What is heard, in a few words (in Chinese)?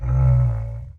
骆驼环境声